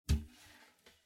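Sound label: toilet seat sounds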